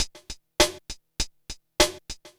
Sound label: drloop, drumloops, Vinyl Attack; Masterbits